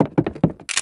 run suicide

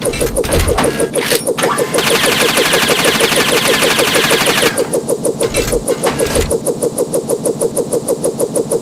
minigun; Armie

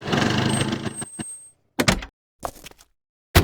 car stop

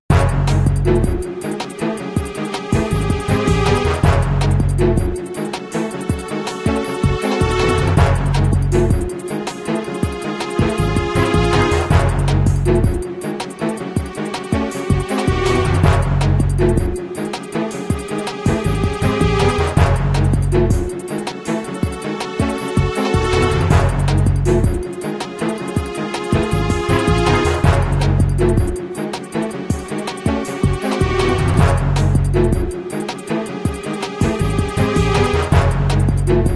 alert; MGS2; Big Shell

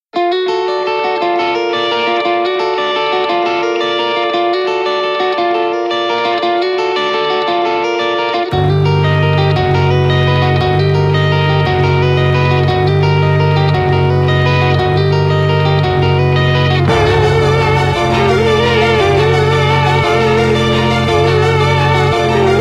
instrumental; Great zawa

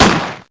weak gunshot

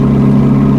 car moving

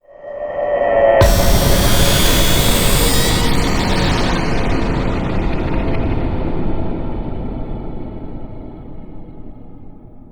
screech

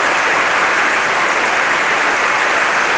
rain

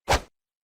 hat off

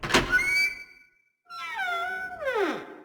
creaky door

